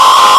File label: jumpscare